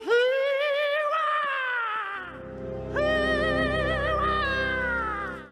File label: hooooooweeaaah